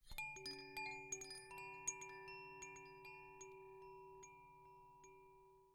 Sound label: windchimes mixed paper aluminum tuned glass clapper mini windcatcher cotton rope; Sonniss GDC